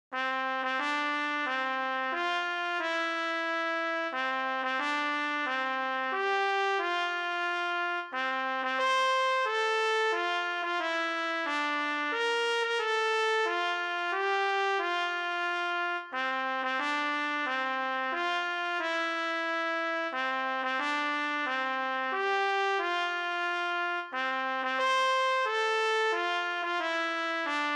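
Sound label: solo Trumpet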